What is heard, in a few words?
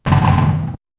the sound when a tank, regular